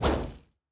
throw ball heat; CrossCode; battle

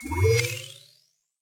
train cube appear

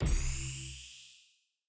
highlight switch

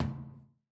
pipe step

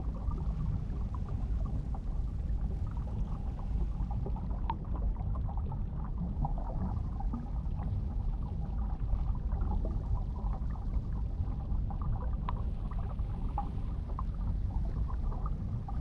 wave ambient; CrossCode

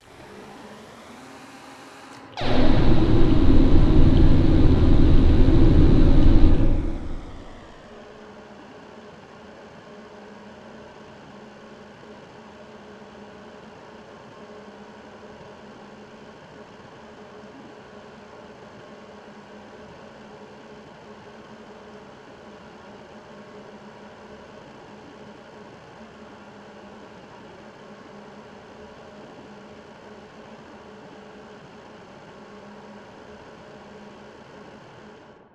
obstacle alien plantlife; The Swapper

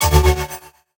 element shield clear

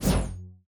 close combat wave sweep; CrossCode; battle

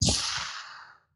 door open

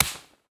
soft slap

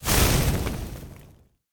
ignite Burn; World Of Goo; Fuse

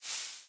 sizzle; World Of Goo; Fuse

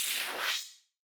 sweep; CrossCode; battle

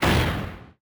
explosion; CrossCode; battle

